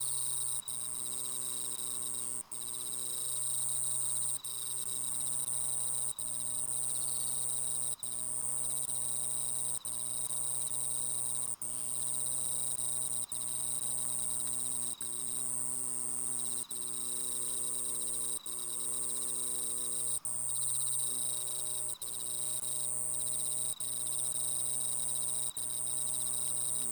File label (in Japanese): kusakiri